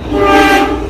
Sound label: klaxon, Train; R220 MUE5 - 6 Car (Alstom) 2019-Present